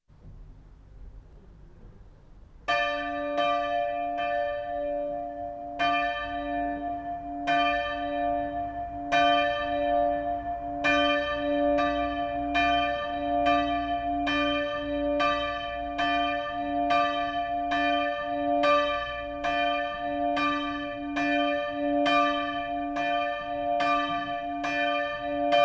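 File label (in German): Geläut der Kirche Glocken nacheinander; Martin-Luther-Kirche in Voehringen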